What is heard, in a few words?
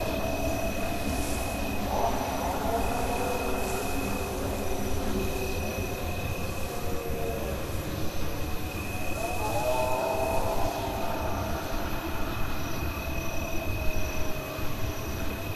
Ambient Forest; silent hill homecoming; ps3